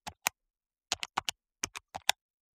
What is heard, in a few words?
calculator keystrokes; calculators